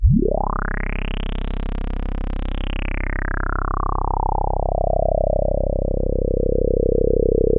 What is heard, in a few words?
TS10 Analog, Synthesizers; Soli-Music; World of Synthesizers VOL-1